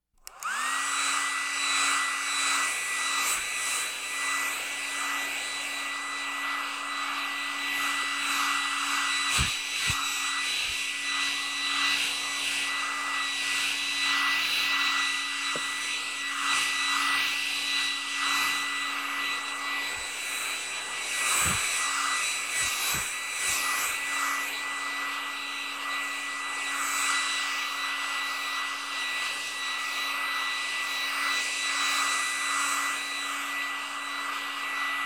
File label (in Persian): صدای سشوار آرامش بخش